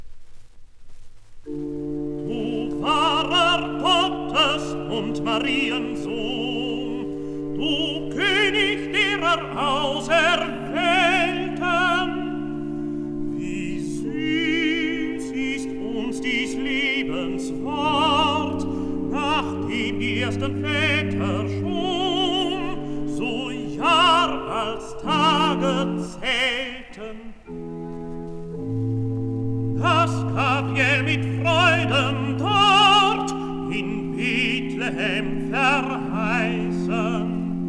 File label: recitativo; bach; bwv1